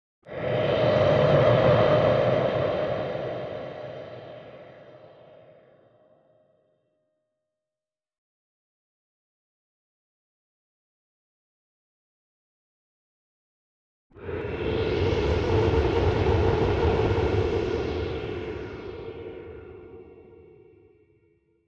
Air Swarm

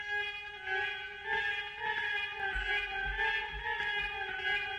Afro Chords Loop 1 Bar 100 Bpm

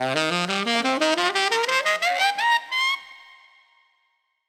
Up And Up Beat Dm 90

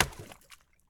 Rock Hitting Icy Lake

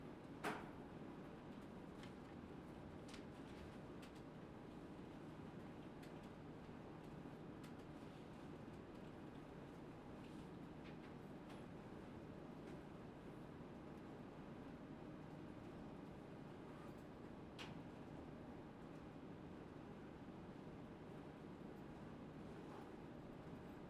Computer Lab

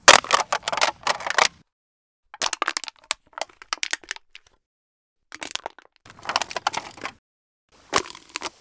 Can Smash

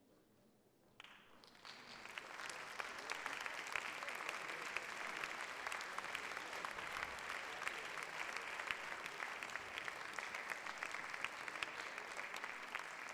Initial Applause

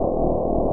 Weird Static

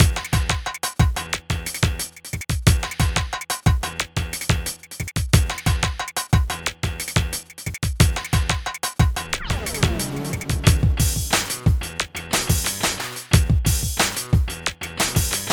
Retro Crime Movie Loop

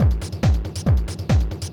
Tech loop

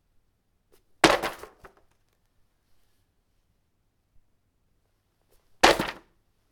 Falling Book

